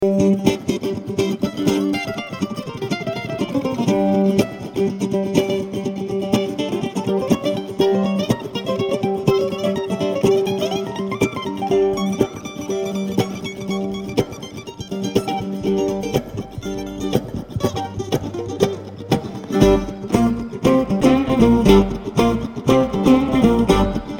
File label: Kentucky Mandolin